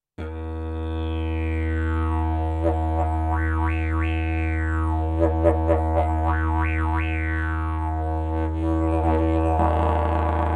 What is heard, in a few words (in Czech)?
javor zeleny, didgeridoo